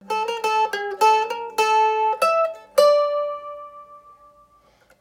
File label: Bulgarian folk middle, mandolin